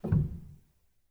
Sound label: rel, Grand Piano; bitKlavier Grand; Piano Bar